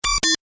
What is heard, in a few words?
Tech beeps, Beeps, High Tech; Stuart Duffield